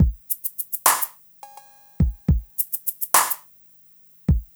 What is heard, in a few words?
Smooth, R&B Drums